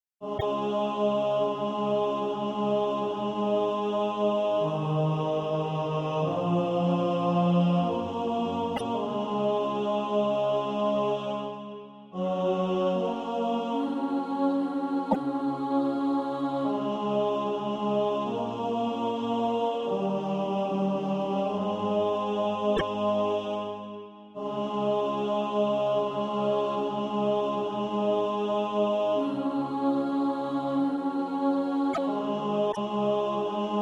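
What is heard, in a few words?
tenor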